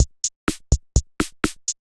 Kit groove 125 bpm; MIDI Clips; 111 - 130 bpm; D55 Essence Kit groove; Ableton; Factory Packs; Drum Essentials

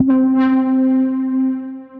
Cast Away, Pad; Ableton; Factory Packs; Punch and Tilt

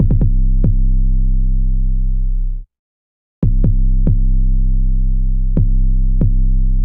808 Bass C 70 bpm; Trap Drums; Solstice; Trap Drums by Sound Oracle; Ableton; Factory Packs

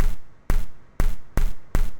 IDM Perc, IDM Percussion; Ableton; Factory Packs; The Forge by Hecq

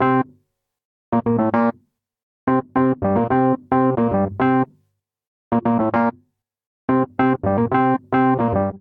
Vamp Funk C13 109 bpm, Electric Keyboards; Ableton; Factory Packs

